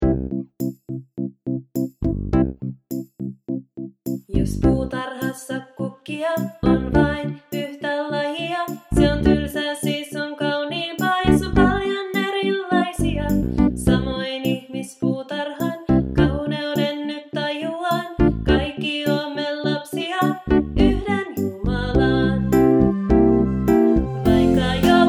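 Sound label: Song, Finnish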